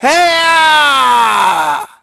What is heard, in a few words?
FALL; SOLOMON; Pulse Racer